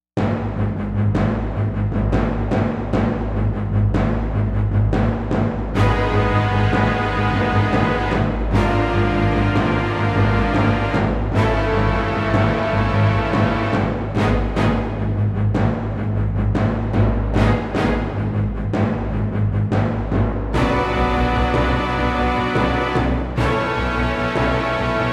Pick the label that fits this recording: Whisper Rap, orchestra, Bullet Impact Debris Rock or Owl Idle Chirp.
orchestra